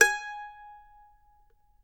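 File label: G#5, Mandolin; Sampleheads; Whole Lotta Country 1 & 2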